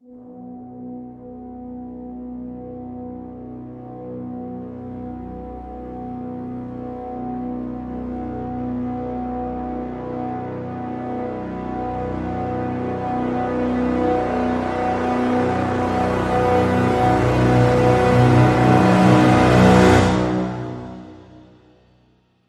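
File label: Crescendo Brass Swell Full (B)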